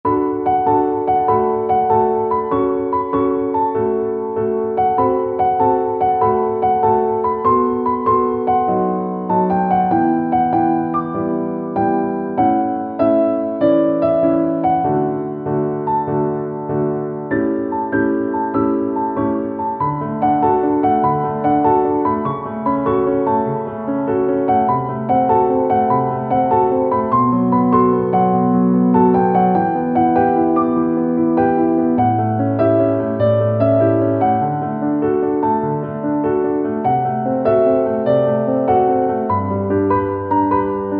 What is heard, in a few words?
piano